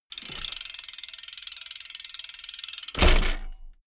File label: bmx bike ramp fly land